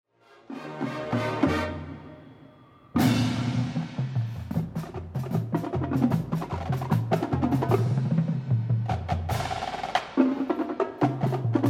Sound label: Drum Feature, marching band